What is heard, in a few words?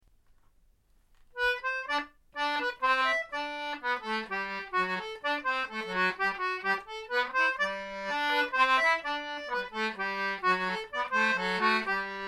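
Waltz on Anglo concertina; Shrewsbury Waltz; A C Norman G-D Jubilee model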